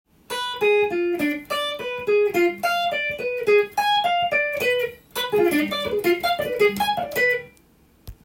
dim picking, sweep picking with diminished chords, guitar